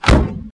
met strk, bugs; Starship Troopers

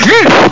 bam; KUNG FU

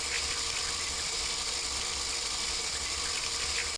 Fire Hydrant; Urban Chaos

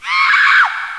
scream; How Computers Work; FX Sounds

